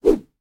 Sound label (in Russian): chyotkij korotkij udar bitoj po myachu